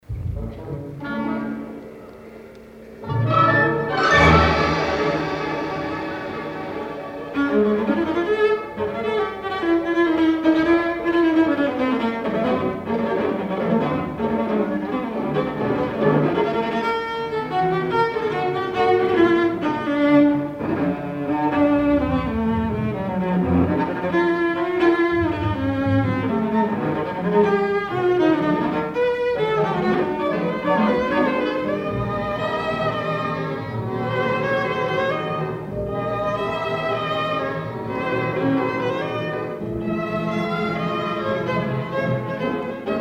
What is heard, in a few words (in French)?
CONCERTO POUR VIOLONCELLE ET ORCHESTRE Allegro appassionato; WALTON